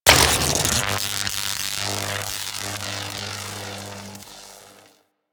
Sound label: spear throw tether activate; Warframe; Weapons; Corpus; Spear Gun